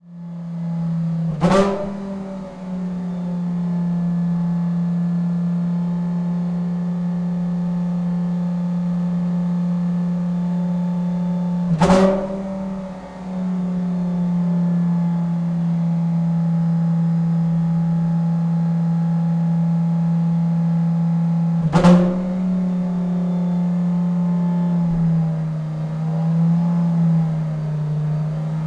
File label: AMB Internal Pit Stop Hockenheim Engine Test; Hockenheimring; Monte Sound; Atmospheres of Hockenheimring